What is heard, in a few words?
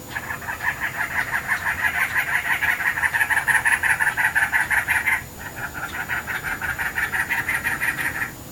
Noisy Bird Sound